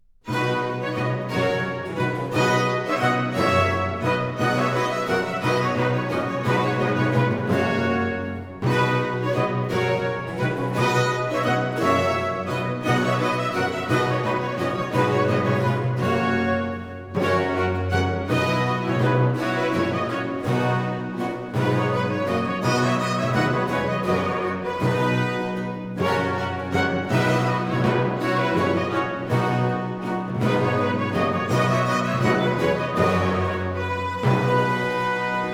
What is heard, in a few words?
Trumpet Tune; Baroque; Purcell; Highlights Opern